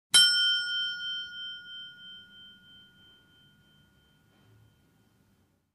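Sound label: High Pitched Desk Bell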